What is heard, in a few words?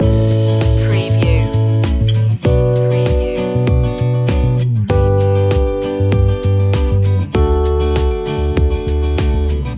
dreamful loop